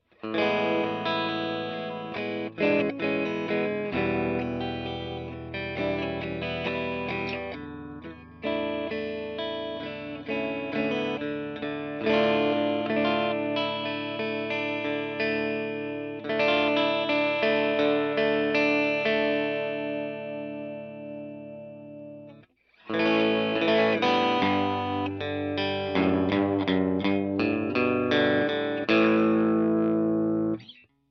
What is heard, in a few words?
clean, Swart STR-Tweed; Clean Too